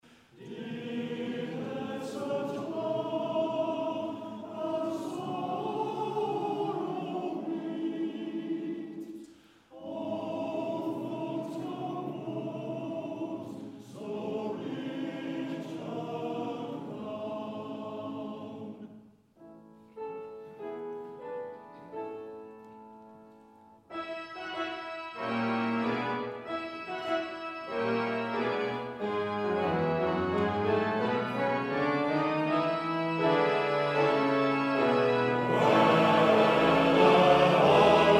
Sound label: organ; Morte Criste